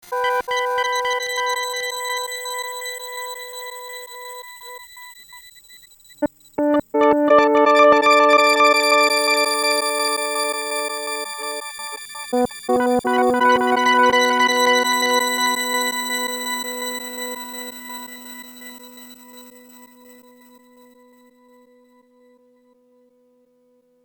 reverse double time, super delay; reverse double speed delay; empress effects

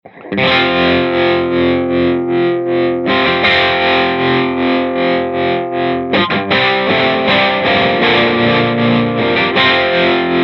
trem single again, tremolo; diamond pedals

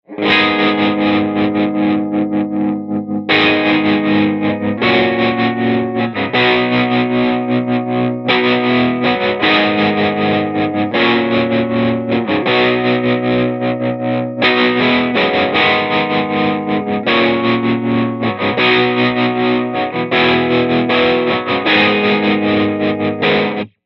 trem la da delay, tremolo; diamond pedals

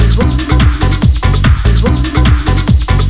BBD Source; doepfer a-188-1

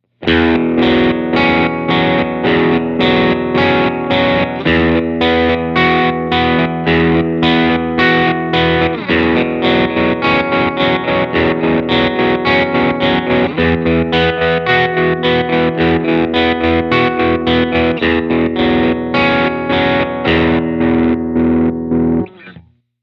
trem square is hip, tremolo; diamond pedals

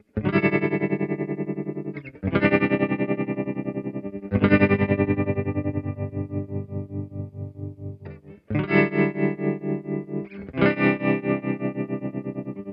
two speed again, tremolo; Two Speed Mode - While in Two Speed Mode, the tremolo rate is switched back and forth betw; empress

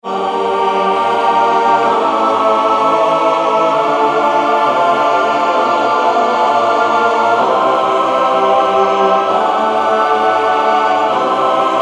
memotron 8 choir studio; manikin electronic

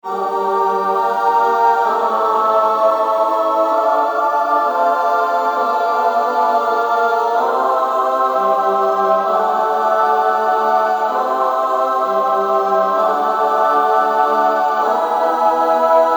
memotron female choir studio; manikin electronic

